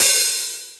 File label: small reverb open hi hat e key, Royality free open high-hat sound tuned to the E note